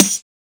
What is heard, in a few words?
Snare; Ghosthack